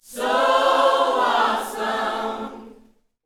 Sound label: Vocal Gospel PHRASE